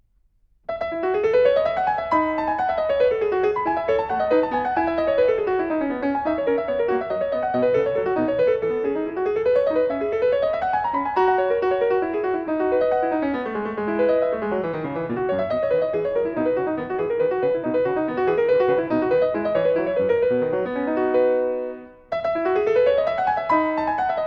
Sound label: Courante